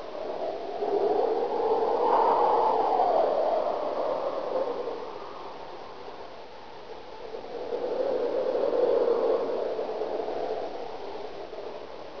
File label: wind